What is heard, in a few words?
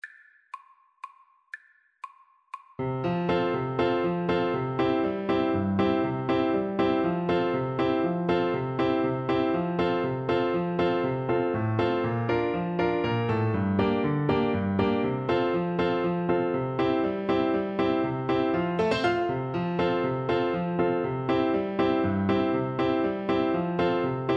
storpolska, flute